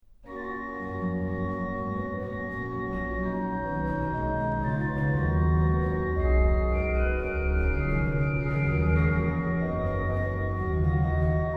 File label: Wir danken dir, Herr Jesu Christ, organ; BWV0623; dass du fur uns gestorben bist; BACH; BACH ORGAN WORKS; CHORALE PRELUDES